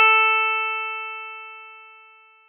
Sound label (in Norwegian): gitar